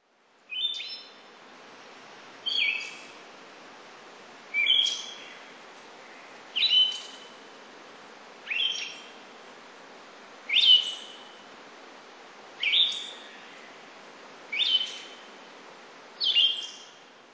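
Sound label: Siberian Thrush; mamijiro; kanuma; koe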